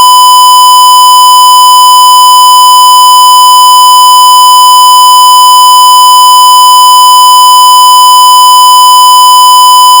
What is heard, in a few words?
1kHz 1dBfs